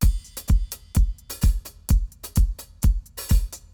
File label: 128, LOOPS DRUMS; Halloumi; RoBKTA Sample Pack Supreme